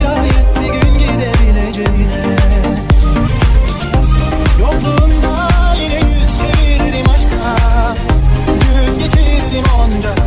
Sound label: tuerkish rap melodisi ringtone